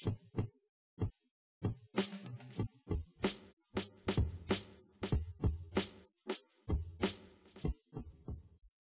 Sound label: wdrums inst sim; SiSEC08; SiSEC underdetermined